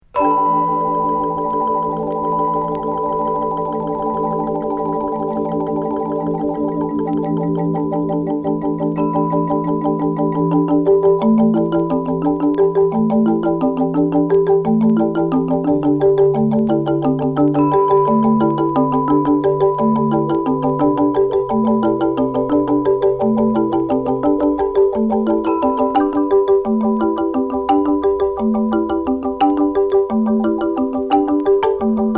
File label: angklung new percussion